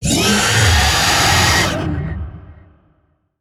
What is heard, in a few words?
Vocal Pain; Warframe; Zariman; Void Angel